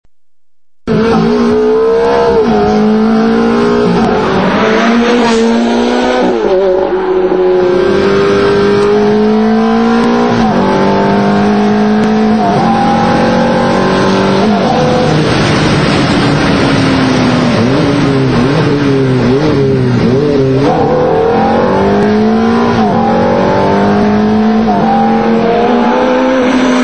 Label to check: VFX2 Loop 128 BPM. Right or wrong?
Wrong